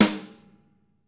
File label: snare, Interactive Drum Kits; Sonic Reality